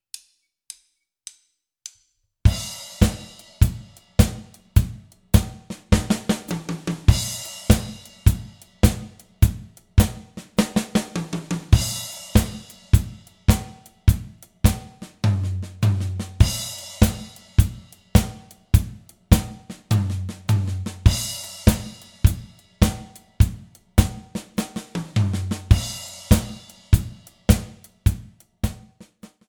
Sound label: shuffle